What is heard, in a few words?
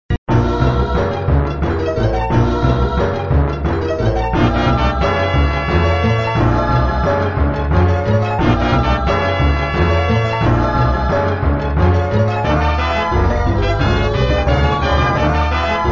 Music, flipper; sttng